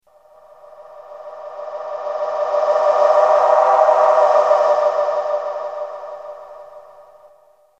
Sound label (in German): plastic schwebe ton